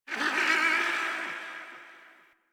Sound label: Landscape Mid Creatures, Ambience